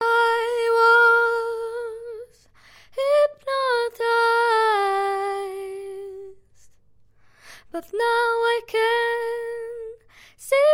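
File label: Pop, 121, G minor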